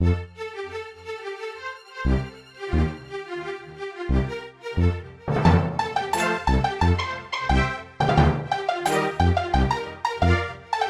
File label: music strings; Bejeweled 3